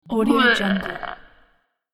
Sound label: dungeon orc